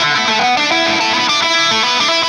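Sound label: Delay Wah, Guitar; Sonic Foundry (Sony Creative Software); Indie Rock Anthems; Session 02 - Clinical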